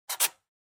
Pencil Check

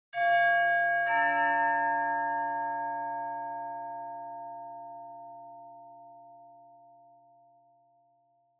Doorbell Mech Tone Low Far; ドアベル 機械式 トーン; 低 遠い

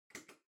Light Switch Wide Panel Far Dry Mid

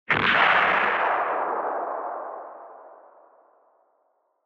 Retro Anime Gun Low Long

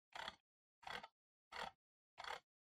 PC Mouse Far Scroll

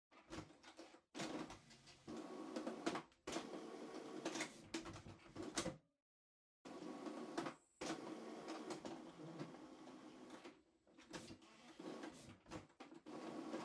Printer Far Cleaning

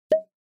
Pop Dry; Onoma

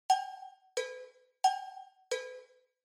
Cowbell Phrase Reverb

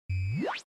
Retro Anime Mech Equip Short

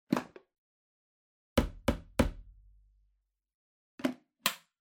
Vacuum Cleaner Dust Cup; 掃除機; ダストカップ

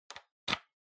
PC Hardware USB Plug In

